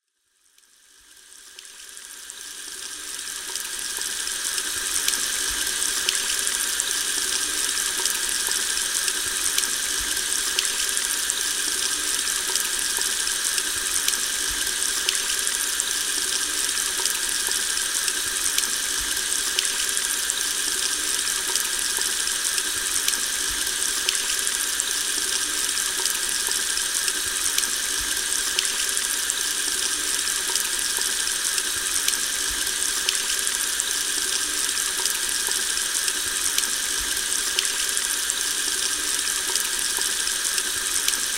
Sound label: rain and thunderstorms